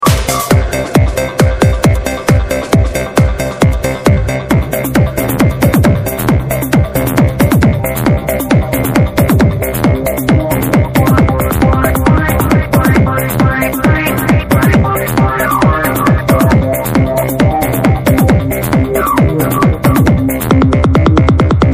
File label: delay